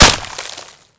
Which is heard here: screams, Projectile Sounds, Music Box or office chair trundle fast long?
Projectile Sounds